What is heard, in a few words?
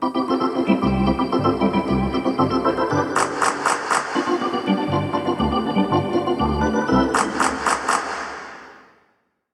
Hockey Organ